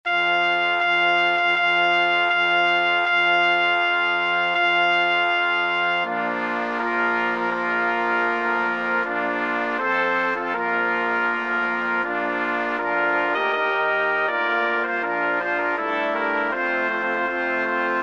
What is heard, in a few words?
Brass Quintet; Bridal Chorus